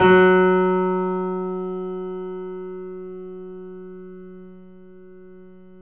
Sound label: F#, Piano; InVision Interactive